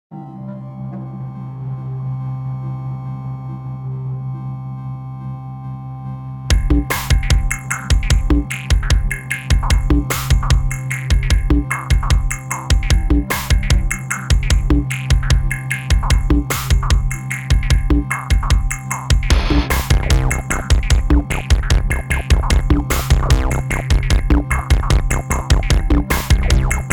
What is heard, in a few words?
Acid Action